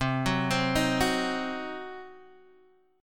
C Diminished, chord; Cdim Chord {X 3 4 5 4 2} arpeggiated

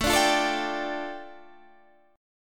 A 7th Suspended 4th Sharp 5th, A, down

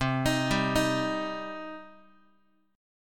C Minor C down Guitar; arpeggiated; JGuitar

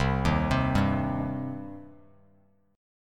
C Minor C down Bass Standard; arpeggiated; JGuitar

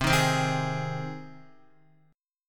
C Minor Sharp 5th, Guitar; strummed; JGuitar

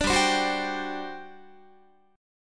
G Major 7th Suspended 4th, Guitar

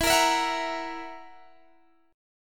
E Suspended 2nd Flat 5th E, chord

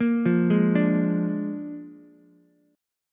Bb 7th Bb down Ukulele; arpeggiated; JGuitar

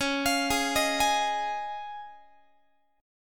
C sharp Major Add 9th, Guitar; C#add9 arpeggiated; JGuitar

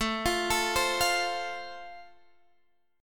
A Minor Sharp 5th A; Am#5; arpeggiated; chordshape; x,12,15,14,13,13

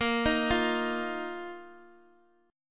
Bb Suspended 4th Bb down Guitar Open D

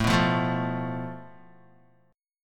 A Suspended 2nd Sharp 5th, Guitar chord; strummed; JGuitar

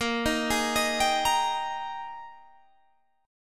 Ab Major 7th Suspended 2nd Bb

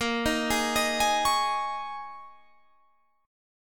D sharp 7th Suspended 4th A sharp, Guitar

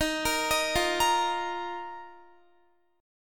Eb Suspended 2nd, Guitar; Ebsus2; arpeggiated

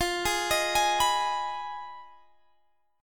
F Minor 7th Flat 5th, Guitar, Standard; arpeggiated; JGuitar

